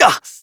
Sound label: Pain, Adult Operator; New War